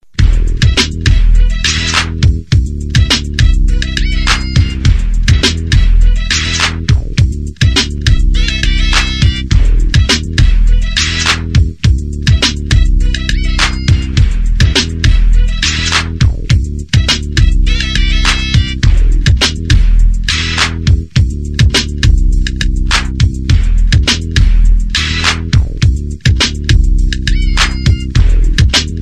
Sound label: Rap Beat